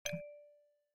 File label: Chip Landing; my cute commissar 2020 gamerip